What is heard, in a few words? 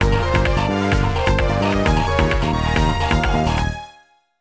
BGM STAGE BATTLE; Phantasy Star Zero